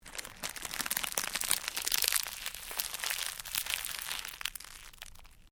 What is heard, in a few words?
Cellophane crinkle